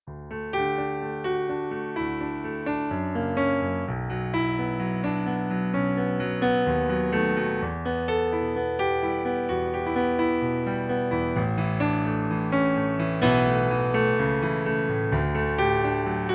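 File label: piano playback D major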